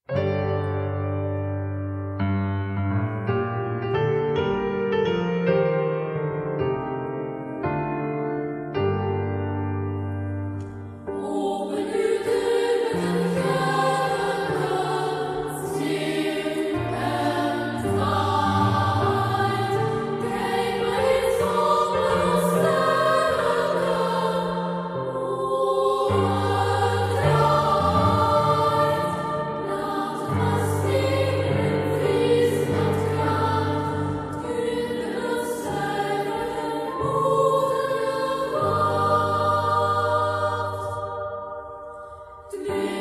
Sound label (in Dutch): Kinderkoor, piano